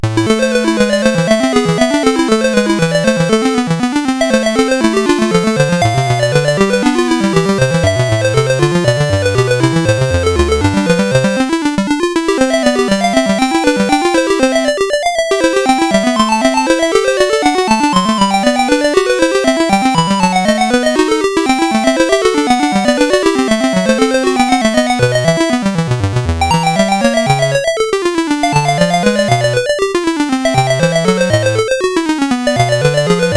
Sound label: Invention XIII, CSG8580R5